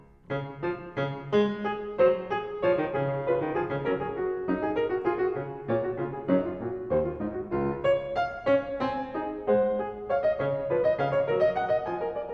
Prelude And Fugue No 3 In C-Sharp Major BWV 872 Fuga, Well-Tempered Clavier; Johann Sebastian Bach